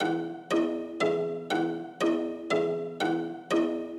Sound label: round robin; eastwest qlso